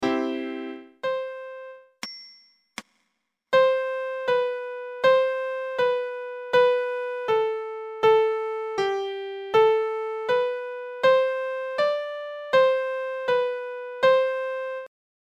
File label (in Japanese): ピアノ音声